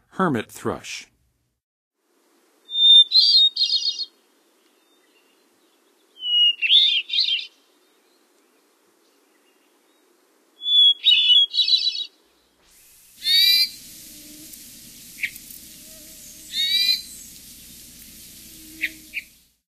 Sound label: Hermit Thrush, Songs of Birds; North America; Western USA; Western USA Songbirds